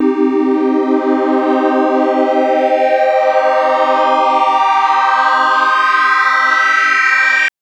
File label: Synth Triangle Sweep Pad min c3; TrashLibrary